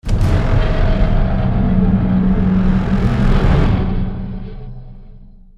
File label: Stinger Action Medium